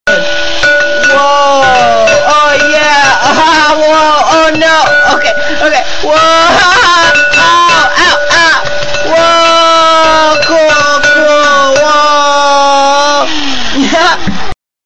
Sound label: ringtone